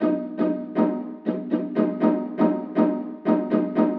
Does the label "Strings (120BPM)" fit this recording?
Yes